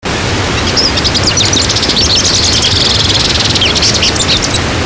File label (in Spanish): jilguero